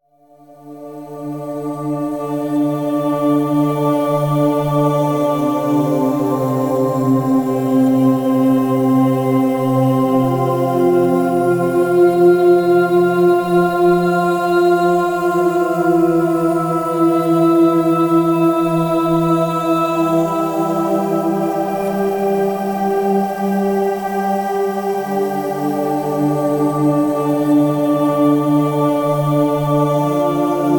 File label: meditation 11hz alpha binaural waves for relaxed focus; simply meditation series